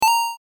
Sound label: coin, pickup; コインを拾う